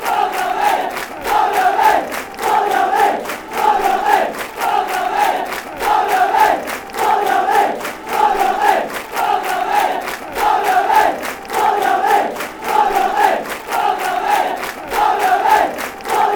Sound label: barmy army, fanchants